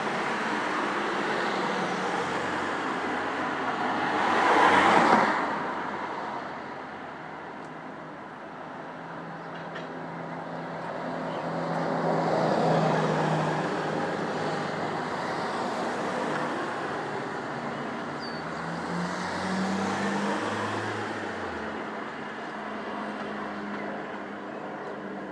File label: bus stop noise